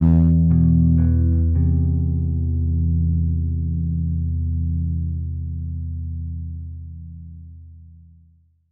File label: bass, Sad; Loops